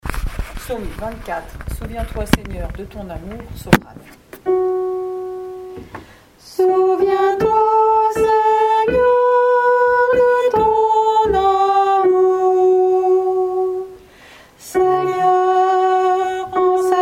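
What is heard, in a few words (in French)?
Psaume Souviens toi Seigneur de Ton amour Soprano; Fonsalas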